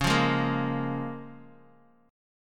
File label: Db 6th, Guitar, Open D